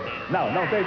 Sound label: wrong; brazil